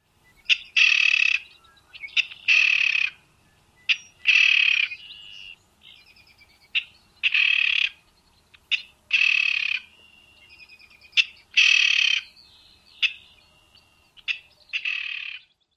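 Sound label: k-kerrr call, bird; OH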